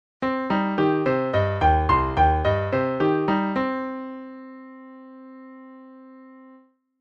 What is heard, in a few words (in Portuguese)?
arpejo